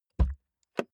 car bmw e46 interior door front open soft; Cars

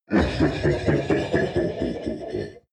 demon dark voice thick laugh; Creatures; kreaturen

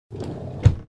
door sliding close

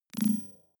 data transfer tonal, signale; soundpool

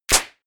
clap, percussion

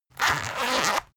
zipper run long; objekte; reissverschluss

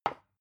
glass put down; objekte

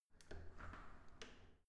entrance door open normal

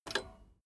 bmx bike pedal foot release soft

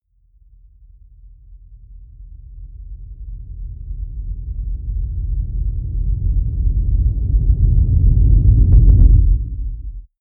deep noise synth rumble distort, risers

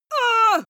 female hit; soundpool

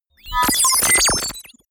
confirm crazy, signale; soundpool

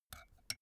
put tea spoon in cup; berufe; gastronomie; soundpool

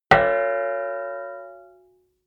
plate hit ring stopped; hits impacts

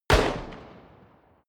rifle distant shot; comedy-cartoon; pistolen-gewehre

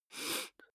nose sniff male sick soft; koerpergeraeusche; menschen; soundpool

